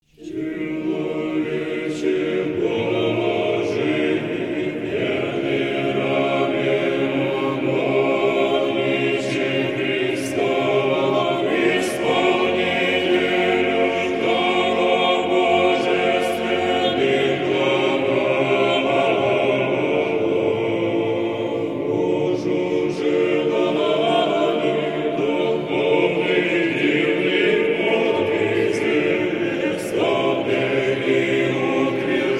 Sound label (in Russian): СТИХИРА; ПРП. ИОАННУ ЗАТВОРНИКУ